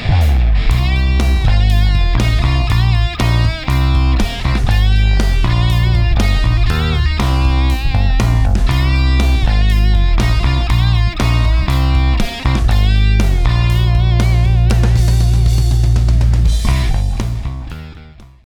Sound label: bass; lesson